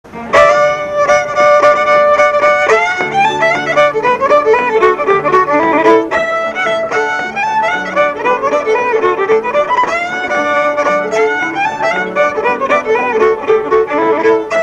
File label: Hornpipe; Durangs